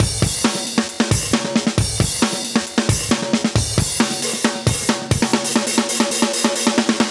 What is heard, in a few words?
Rubber Band Break, 135, breaks, Live Breaks